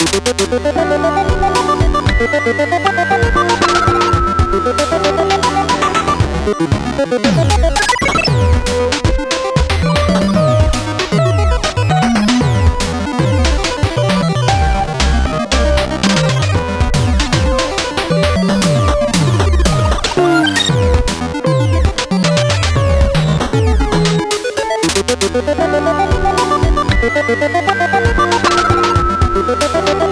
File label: 8bit BGM